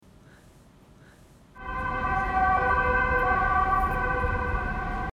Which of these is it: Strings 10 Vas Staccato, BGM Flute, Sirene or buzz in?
Sirene